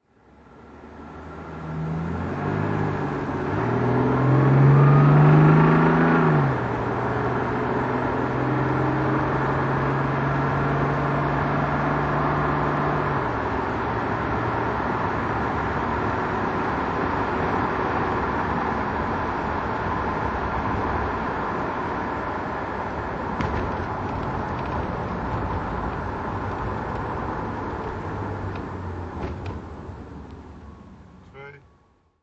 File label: jaguar XJ6 42 coupe driving